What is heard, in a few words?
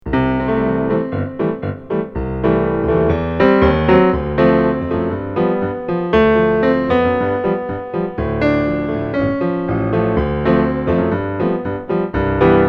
rumba; ladinatantsud